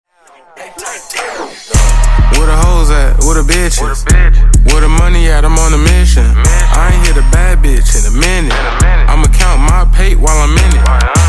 Beatappela Intro Outro 102Bpm

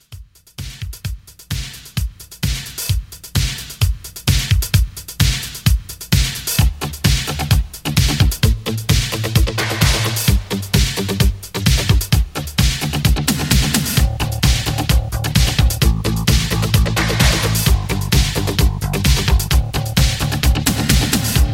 80s Pop Re-Drum 130

